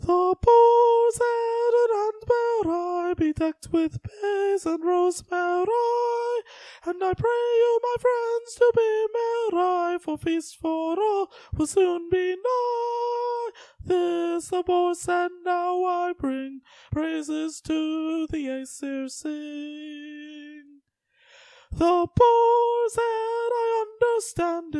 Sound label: carol alto; choir